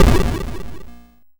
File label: YM FX Boom; SFX; ChipShop Deluxe; ChipShop Hits